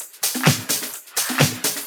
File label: Percussive Loops 128BPM; Vengeance Samples